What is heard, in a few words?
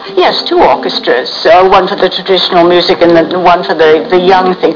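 orchestra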